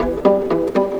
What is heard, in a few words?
Strings Pizz, Cinematic; Sonic Foundry (Sony Creative Software); Orchestral Series VOL-3